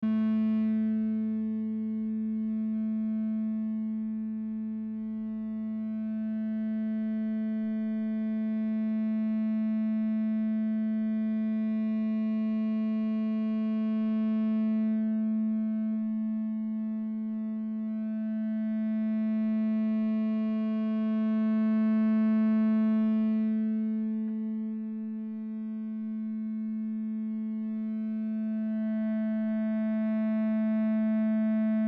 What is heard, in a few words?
A Drone on Ebow